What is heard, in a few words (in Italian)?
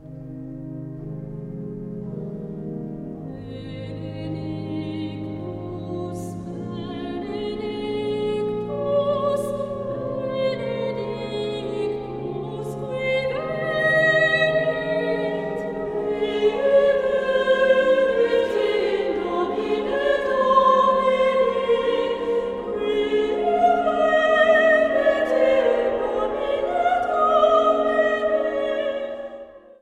voci